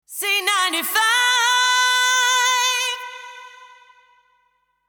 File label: Jingle Acapella; C95 Jingle Package